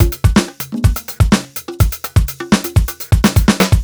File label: 125 la fine beat, Nu Jazz House; 125 Beats